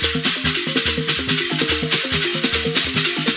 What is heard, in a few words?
A# MELODY; Northstar; The Wizard VOL-1; AFROSIS